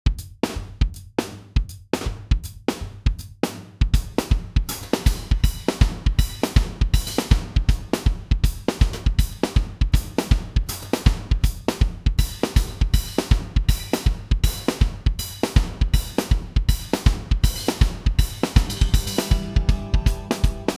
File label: smooth blues; smooth blues with light d